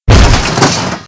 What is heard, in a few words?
Sword God Fall; Playable Tutorial Level